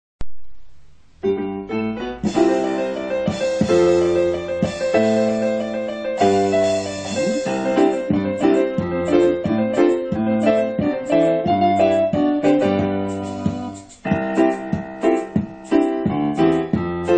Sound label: piano